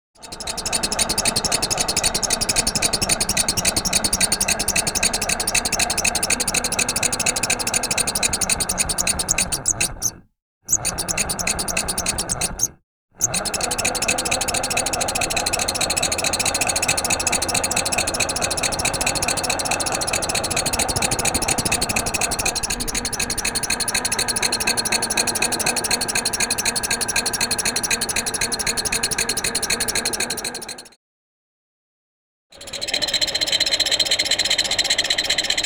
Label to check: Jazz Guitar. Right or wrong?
Wrong